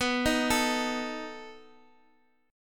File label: B 7th B down Guitar DADGAD